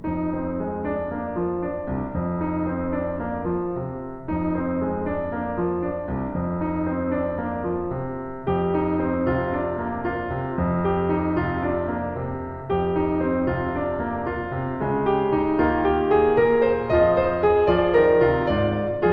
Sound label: piano